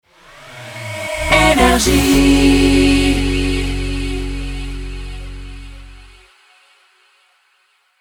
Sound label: Jingle Rapid Fire; NRJ